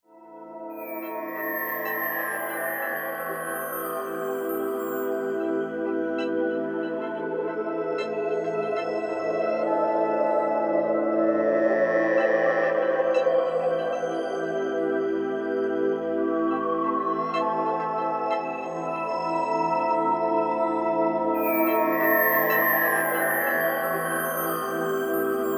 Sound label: Spa Music Relax